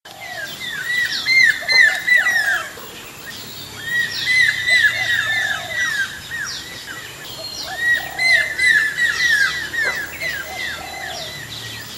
Storkbilled Kingfisher display calls, Ipoh Perak Malaysia